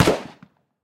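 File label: muffled single shot; priglushennij odinochnij vistrel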